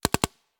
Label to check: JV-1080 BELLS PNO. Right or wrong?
Wrong